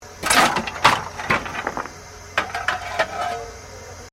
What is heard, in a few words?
Steamtrain Shovelling Coal; Bellarine Tourist railway vehicles